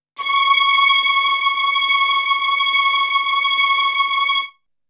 Strings; ZERO G - Datafile DISC 3

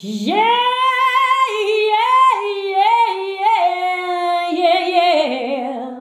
YEAH I YEAH, FEMALES; Best Service; Hyper Dance

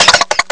cough; ST-XX Amiga Soundtracker Sample Packs

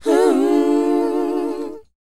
WHOA C, Vocal R&B & Dance; ILIO; Vocal Planet

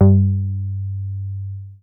G2, BASS; Q Up Arts; Dream Experience

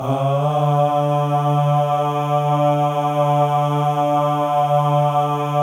MALE AHS; Spectrasonics; Symphony of Voices

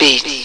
Beats, Amiga Soundtracker; ST-XX Amiga Soundtracker Sample Packs

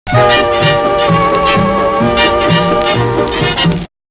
Jazz loop; Coldcut's Kleptomania VOL1

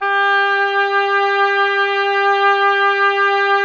oboe; Miroslav Vitous; Solo Instruments VOL-2

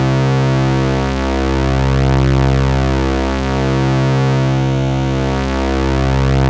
FAT PWM; Zero-G Ambient 1

